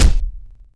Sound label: Hit Unit; Civ4 warlords